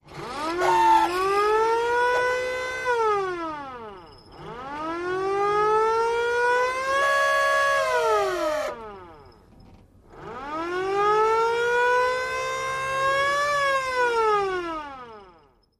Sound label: Hand Turn Siren, sirens